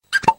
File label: Pop; Katmari